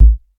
00s round kick single shot a key, Royality free kick drum sample tuned to the A note